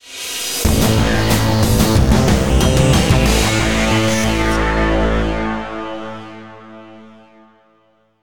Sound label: music stinger challenge complete; AirMech Command VR